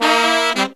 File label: Pop & Funk Brass; Best Service ProSamples vol.25; AKAI